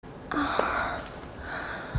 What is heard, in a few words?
soft ohh